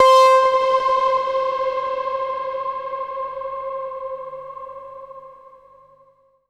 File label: Echo Bell C3, Bright; Trance Formation